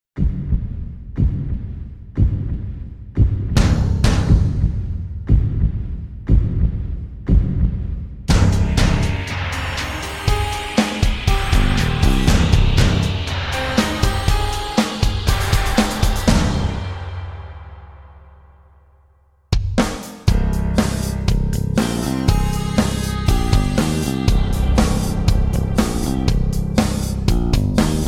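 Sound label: C minor No Guitar No Backing Vocals, backing tracks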